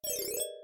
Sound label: Reset Button